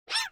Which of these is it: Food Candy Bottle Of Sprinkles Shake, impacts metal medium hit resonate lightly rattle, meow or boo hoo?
meow